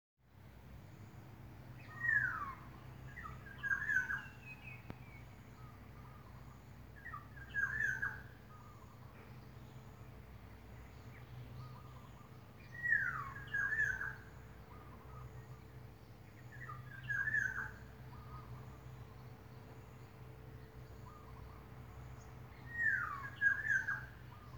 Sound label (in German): Vögel